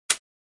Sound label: Button Toy Drum Hit